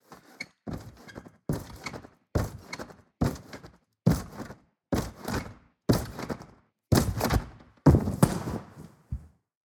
Trampoline Jumping Landing on a Mat; SoundBits; Gym Day

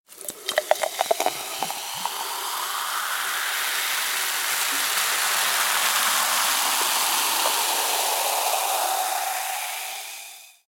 Pouring Champagne Sparkling; TeaSound Games

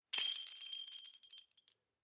Peal Sleigh Bell; Articulated Sounds

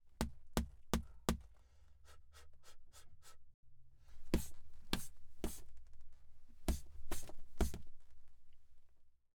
Punchy Boxer Hits Breaths; Artlist Original

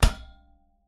Football Hits Post; Echoto Sound; Sportsmanship

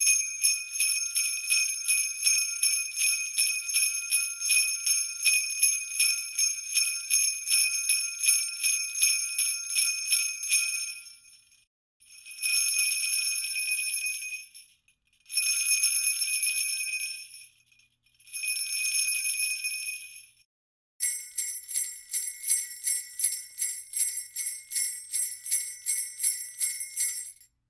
Sleigh Bells 80 BPM; Rick Allen; Beyond Imagination

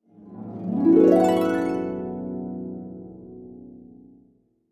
Harp Glissando Ascending; Merry Christmas

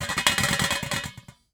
Shaking Metal Pot Vigorously; Artlist Original; Cooking Joy

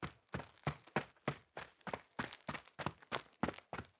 Shoes jogging in the dirt; Foley Walkers

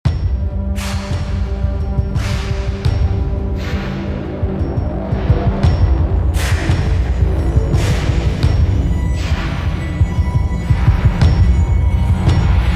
Suspense Logos Epic Cinematic Musical Logo; Unrealsfx; Attack of the Orcs

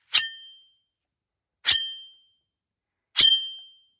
Foley knife sharpening repeated; DB studios

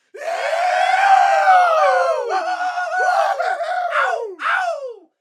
Small Crowd Cheering Whooping; Stoked Sound Effects; American Pie

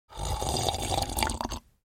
Drinking Coffee Slurp; Artlist Original; Cooking Joy

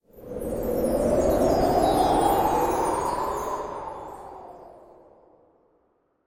Christmas Whoosh Winter Mystery Windy; HD Audio; Christmas Spirit

